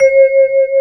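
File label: vibraphone A2, jazz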